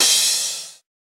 CrashHat; Anthro

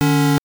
8bit

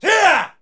SE GAME BREAK; maimai

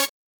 synth

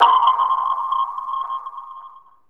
SE GAME SLIDE; maimai

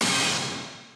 stab

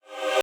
choir fx

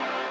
lead

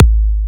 Kick; WAProd Free Kick Samples 2018; WA Kick Pack 2

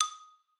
xylo

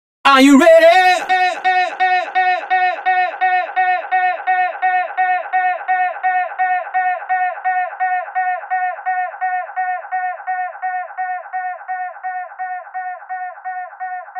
Vocal

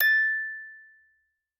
musicbox

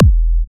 Kick; WAProd Free Kick Samples 2018; WA Kick Pack 4

